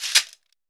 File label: cabasa; Brazil